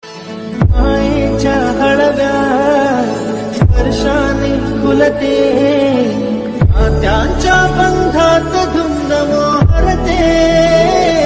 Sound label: Marathi Ringtone